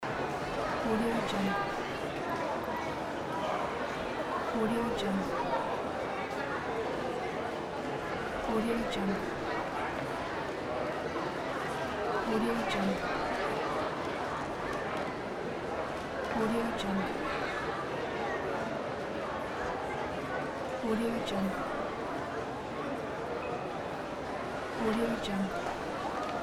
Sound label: people noise